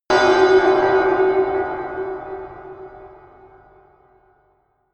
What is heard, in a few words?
melodic disharmonious bell high